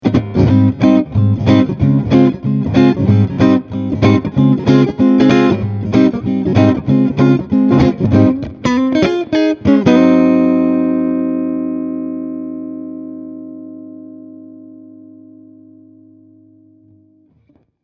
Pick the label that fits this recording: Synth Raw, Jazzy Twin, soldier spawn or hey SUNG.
Jazzy Twin